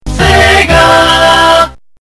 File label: startup; sega